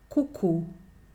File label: burrowing owl